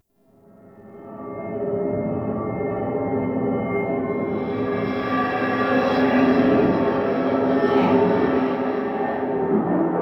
Metallic Drone; Ambience; Warframe; Nineteen Ninety Nine

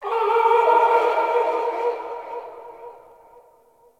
Venus Landscape Distant Creatures; Warframe; Venus Open World; Ambience

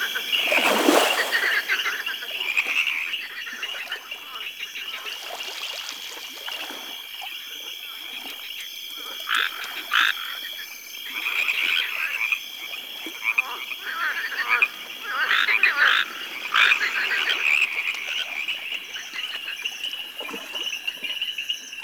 Exterior Lake Critter Loop; Duviri

